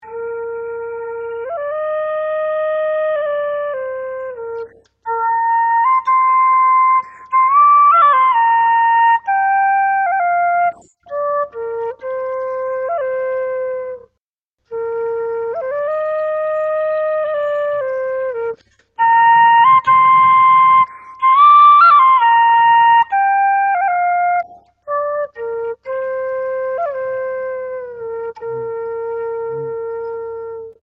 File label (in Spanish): flauta